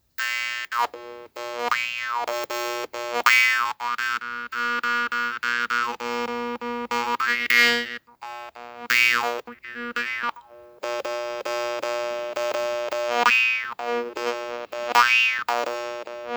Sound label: brumle, jew's harp; mala